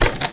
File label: button